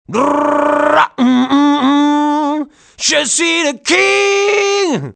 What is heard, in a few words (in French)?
chant; Mobiclic51